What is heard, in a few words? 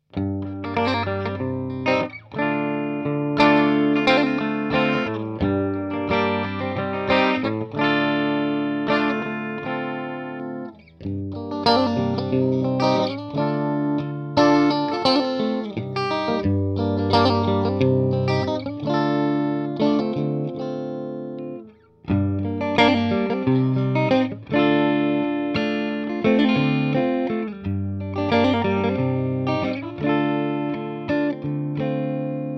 rock